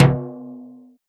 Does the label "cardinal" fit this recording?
No